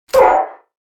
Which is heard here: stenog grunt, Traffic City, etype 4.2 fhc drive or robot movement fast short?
stenog grunt